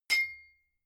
hammer hit ring high

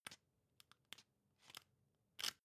magazine browse

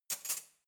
cutlery knife drawer place soft

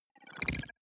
data transfer pluck

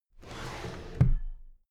drawer cabinet paper close regular halfway

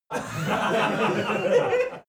laugh male loud

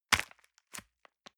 envelope grab release hard

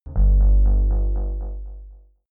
synth bass bounce deep short

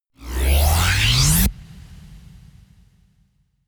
sweep

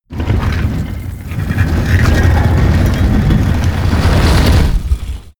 stone gate close

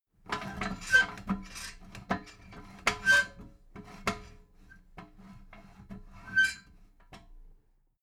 metal ladder small handle

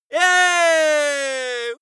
male victory citizen

